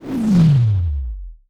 Science Fiction Sci-Fi Electronic Exiting Hyperspace